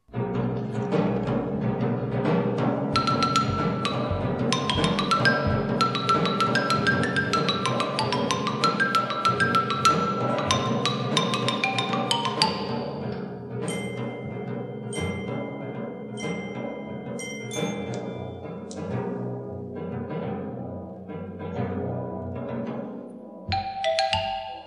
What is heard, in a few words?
Variations Percussion